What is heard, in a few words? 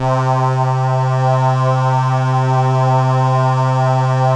CRUMAR; Masterbits; Vintage Voltage